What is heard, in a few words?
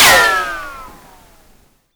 helmet ricochet